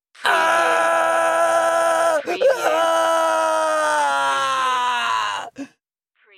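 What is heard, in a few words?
Man Scream Ah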